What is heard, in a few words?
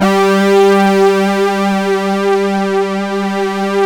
SYNTH PAD; Sound Gallery - Charged; SYNTH PAD 1